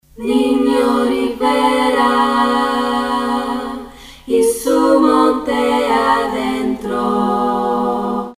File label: mambo; ringtones